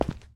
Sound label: concrete